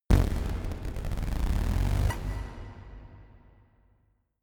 synth bass pluck

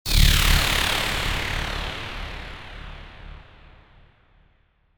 lazer

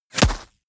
bullet small impact ground

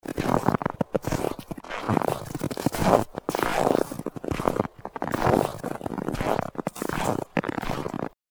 steps in snow

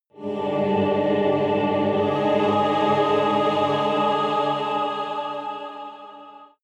evil choir chord up

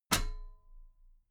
metal ladder step regular

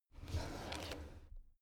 drawer cabinet crammed open soft halfway

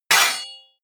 hit metal sword medium

